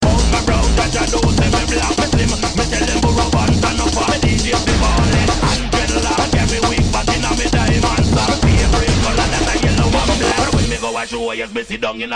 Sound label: bgm